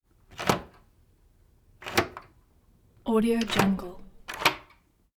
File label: deadbolt dull metal slide open and close